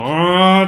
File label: weng